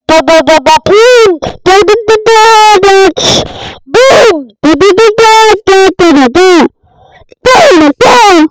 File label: default dance boost